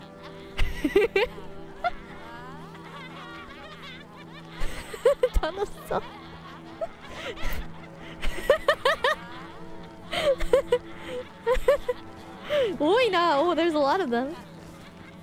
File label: more cute giggles